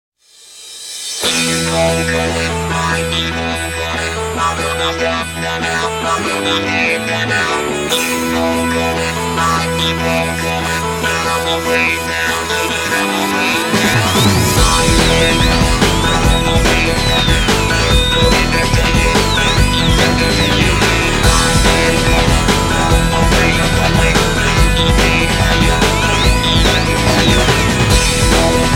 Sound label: Psychedelic Souljam